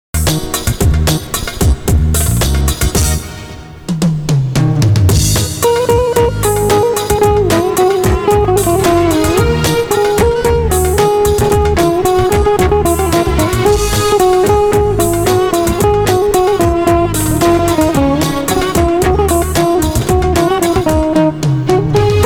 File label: Music